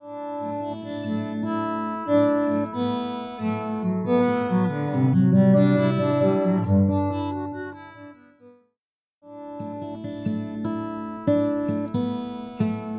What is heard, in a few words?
Bowed Guitar; Preset: Bowed Guitar; Physion Mk II